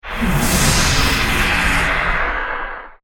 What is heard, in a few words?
Alien Pneumatic, Scifi, Alien, Pneumatic, Expel, Steam, Science, Fiction; Aliens; Blast Wave